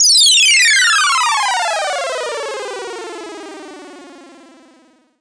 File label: 1970s synth bend down; Electro and Synthetic